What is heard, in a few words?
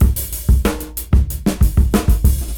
Busy Break 93 BPM; AMG - Keith LeBlanc Phat Butt Jams